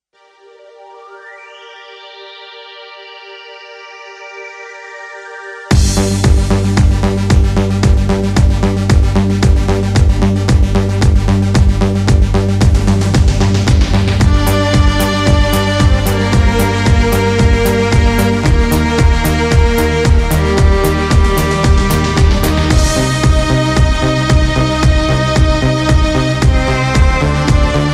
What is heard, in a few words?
Korg Pa900 modern beat; Sergey K